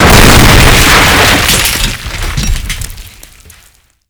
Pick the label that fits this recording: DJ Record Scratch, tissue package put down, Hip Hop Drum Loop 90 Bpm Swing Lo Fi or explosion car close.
explosion car close